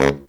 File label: BARITONE FX; Zero-G; Phantom Horns